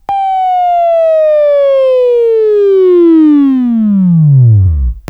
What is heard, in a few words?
Buzz